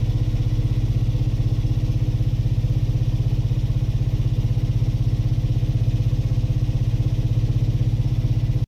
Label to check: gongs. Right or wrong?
Wrong